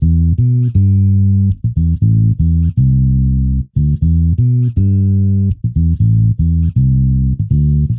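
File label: Bass Alternative E minor 120 bpm; Guitar and Bass; Ableton; Factory Packs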